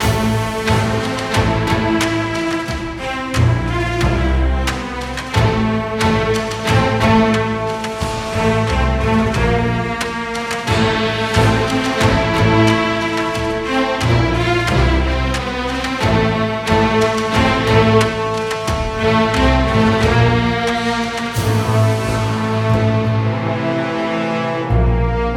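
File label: background music preparation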